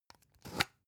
spice jar big scratch open slow; industrie wirtschaft; gastronomie

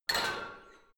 barbell heavy plates lift rack, fitnessstudio

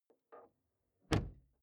car door close exterior hummer h3; Cars

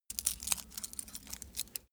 small metal handling jingle short

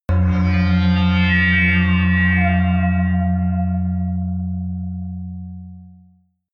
whistle synth high, drones

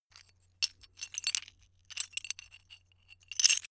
metal pieces clatter

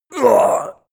male death short; schreie; menschen; soundpool

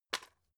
drug package drop soft; arzt

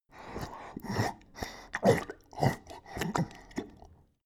dog drink snort; natur-tiere; hunde

